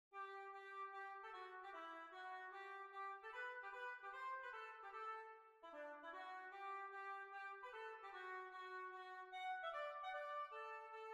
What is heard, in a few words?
Kirkgate Hornpipe